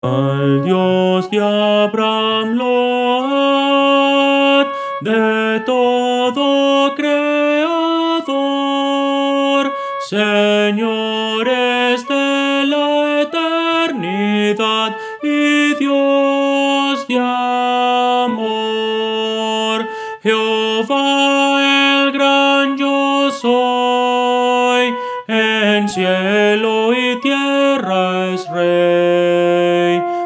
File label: soprano